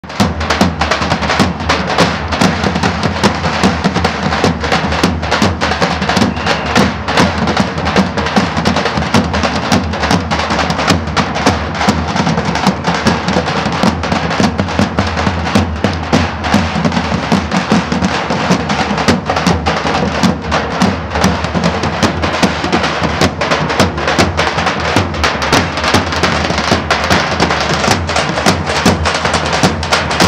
gures havasi davul zurna versiyonu; yangi koyunde; halil ibrahim

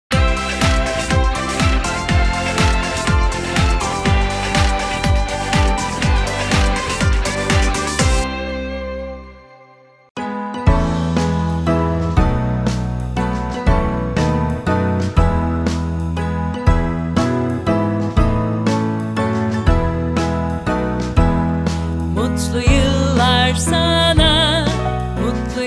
dogum gunu sarkisi mutlu yillar sana iyiki dogdun happy birthday to you turkce

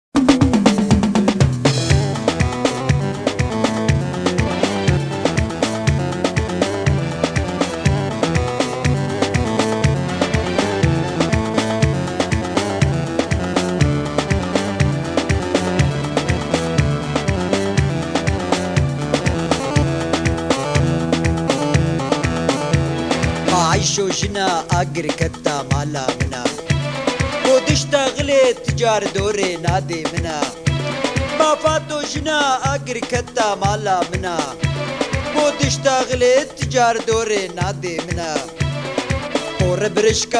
Kurtce komik muzik

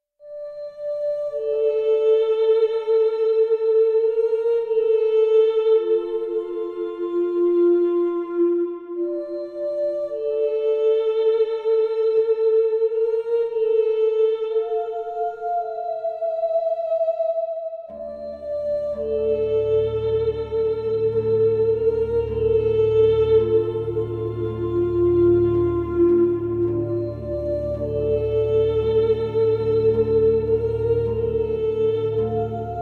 fon müziği

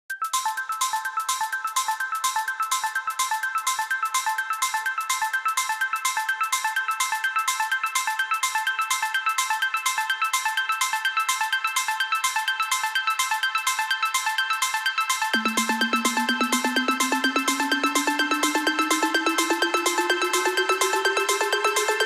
azeri bass muzik duygusal; erbaa merkez

